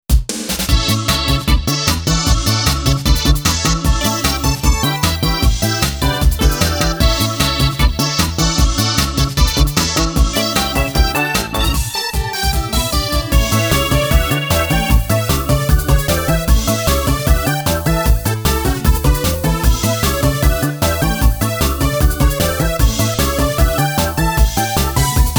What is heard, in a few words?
High Tension; Memories Off Sorekara Again